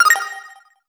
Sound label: Get Item; Alterna SFX